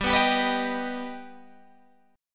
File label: G Augmented G Guitar Open G